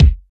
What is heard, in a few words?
raw kick single shot d key, kick drum single shot tuned to the D note